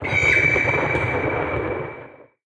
Violet What

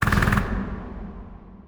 Seedling Attack

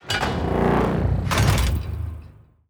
Doomsday Machine Death

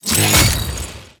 Golem Attack

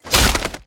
Flying Chicken Attack

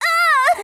Harpy Death

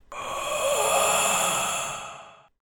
Death

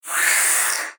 Consort Death

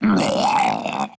Dark Mage Death

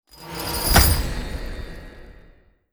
Oathbreaker Death

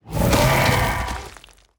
Quill Shooter Death

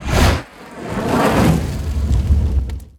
Pyro Attack

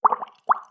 Pollywog Attack

